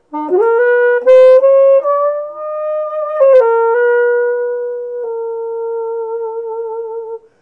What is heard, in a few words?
alto sax melody